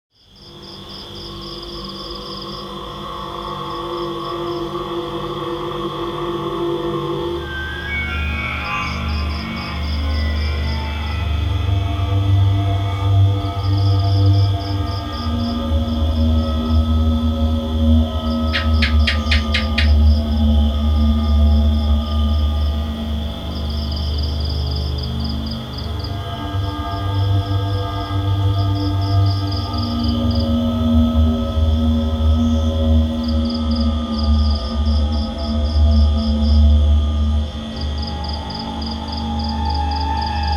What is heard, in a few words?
night ambience; Milk outside a bag of milk... OST (2021)